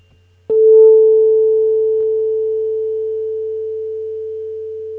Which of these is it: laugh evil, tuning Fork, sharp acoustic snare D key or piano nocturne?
tuning Fork